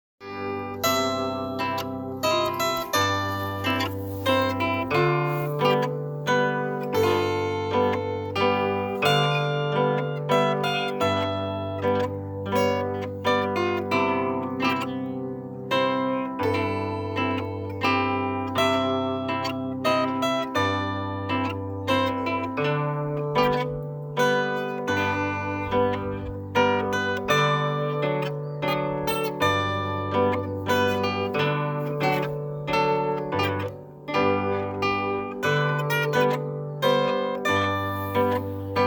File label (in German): Zither